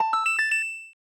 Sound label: Chest Appears; Legend of Zelda, The : A Link To The Past